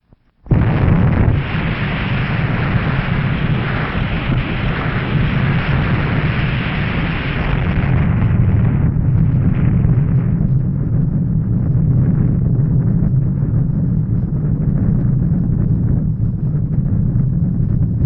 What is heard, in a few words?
Atomic Bomb Test